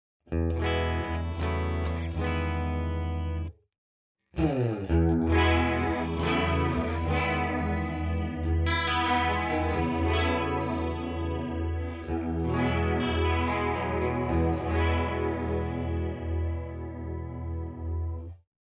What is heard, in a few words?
Walrus Fundamental CHORUS Heavy